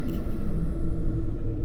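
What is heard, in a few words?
Music Dpad Evaporate 145; from dust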